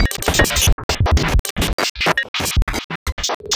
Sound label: Blip Garbled Transmission